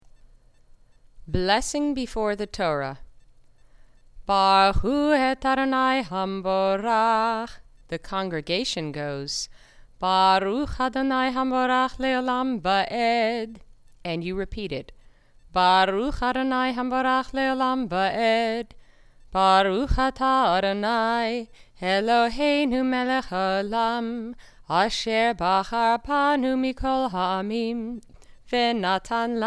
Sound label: blessing before reading torah